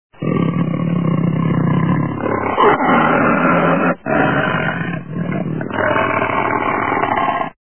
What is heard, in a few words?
tiger